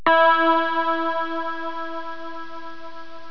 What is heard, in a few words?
DING